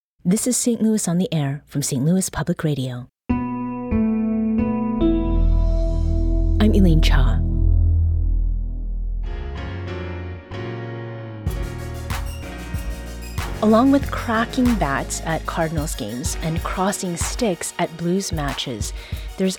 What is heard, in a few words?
Sports Organ; stl on the air